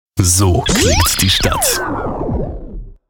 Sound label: Jingles Shotgun; Radio Geretsried